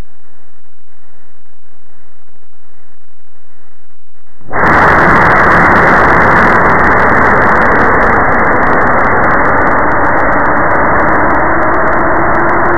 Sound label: Centrifugal Interchange, Plasma Sounds